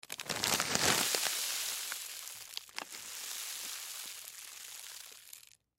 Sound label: Pretzel Bag Spill, Pretzel Bag Tip Over Salt Slide Fast; Food Sound Effects; Food (Sound Effects:Household); FOLEY